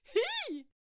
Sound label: Attack Vocals; Hay Baler